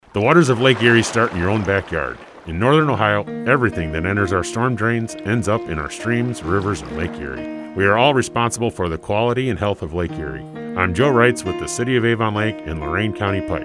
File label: pipe